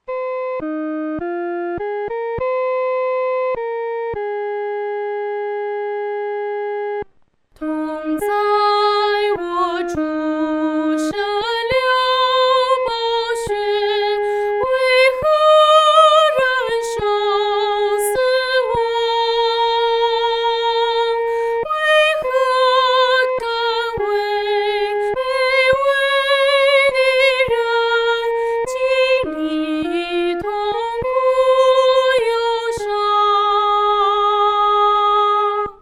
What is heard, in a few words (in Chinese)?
独唱（第一声）